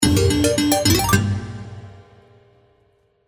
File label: win med; Crystal Furnace